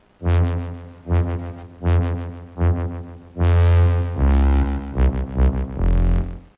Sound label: Dance Bass